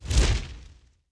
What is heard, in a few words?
near Win Flame; warlords